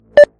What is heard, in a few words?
nuclear buzz; mi6